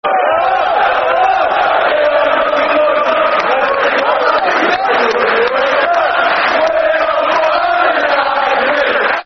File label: Football Chant (Soccer Song), Man United; Hello, Hello We Are The Busby